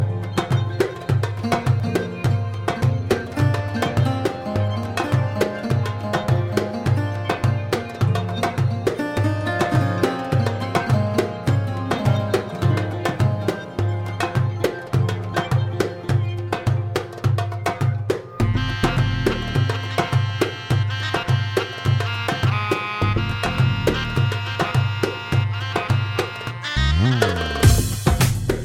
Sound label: Cool Arabian Sound